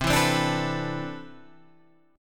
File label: C Major 9th Add 13th, guitar chord